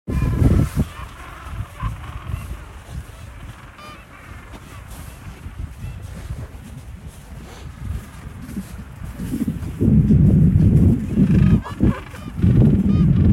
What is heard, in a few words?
Mikkelson Harbour afternoon landing